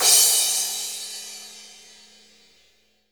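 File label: cymbal crash; Best Service Gigapack 2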